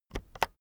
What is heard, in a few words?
keyboard external enter press slow